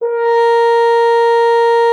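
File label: French horn A#4; Xsample VOL-3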